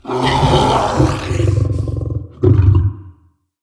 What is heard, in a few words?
Behind Wall Attack Growl, Animals; Bromius